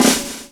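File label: drum roll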